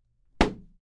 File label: Explosion